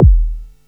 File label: 40HZ S.KICK